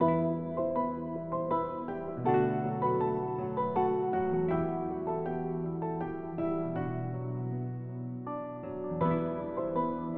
Music road Loop; Cuchulainnroad; Curse of the Sea Rats

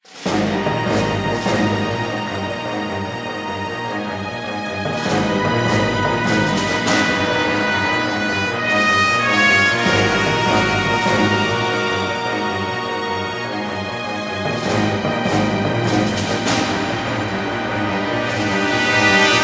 Sicily Action

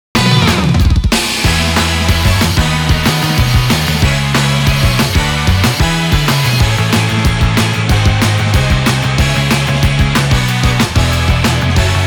Inst; magical halloween7 original soundtrack; Mh6 New World!

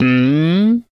Classic Miss Sound; Jolly Battle; Android

